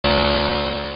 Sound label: chainsaw